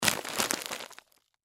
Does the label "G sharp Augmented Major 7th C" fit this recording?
No